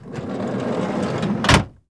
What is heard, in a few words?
SLIDE DOOR C, CARS